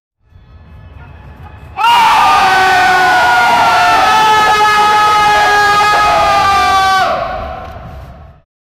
warriors running ang scre

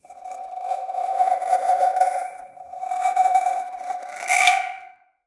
sound effect of, ice

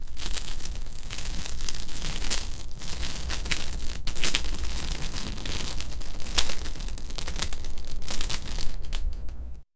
lether armor, cloth

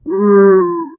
sharp wretched yelp of a, zombie

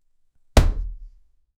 man get hitted by object; death

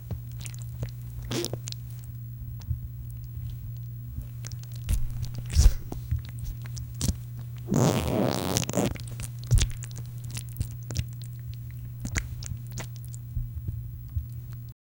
a crinkling diaper the fa

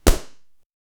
loud and sharp single bal, impact

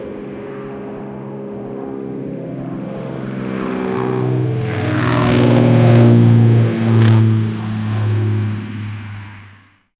a propeller plane; airplane

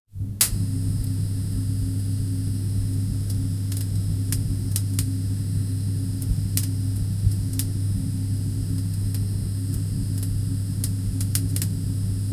oven with fire, appliance; sfx for oven with fire

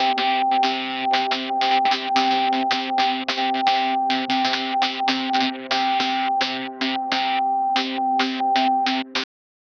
urban pulse drop trap; 8s

